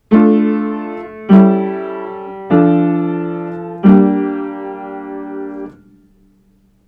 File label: Part Writing 7th Chords Aural Training exercise